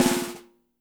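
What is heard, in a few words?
piccolo snare; Spectrasonics; Burning Grooves CD2; DRY KIT 4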